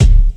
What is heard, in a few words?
Kick